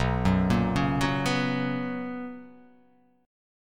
B Major 7th Suspended 2nd, chord; BM7sus2; arpeggiated; JGuitar

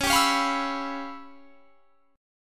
C sharp 7th Sharp 9th Flat 5th, Guitar; strummed; C#7#9b5; JGuitar

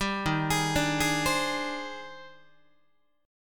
D sharp Major 13th, down, Guitar; arpeggiated; JGuitar

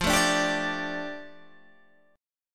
F 6th Add 9th Guitar Open G, chord

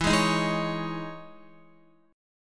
E Major 13th E, Guitar; strummed; 4F,4A,5C,5G,5C,6E; JGuitar

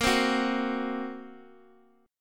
A sharp Suspended 2nd Sharp 5th A sharp

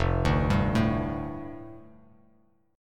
F 6th Add 9th F down Bass Standard, chord; F6add9 arpeggiated

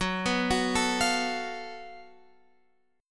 F sharp Minor Major 7th Double Flat 5th

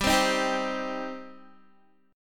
G Suspended 4th Sharp 5th G down Guitar; Gsus4#5 strummed

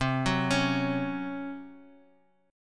C sharp Major 7th Suspended 4th C

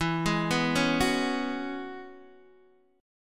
E 6th Add 9th E down Guitar DADGAD

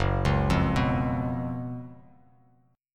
Gb Major 7th Suspended 4th, down; arpeggiated; JGuitar

